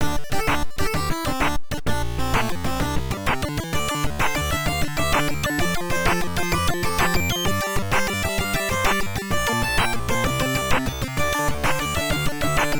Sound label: Chiptune Remix; Wuthering Waves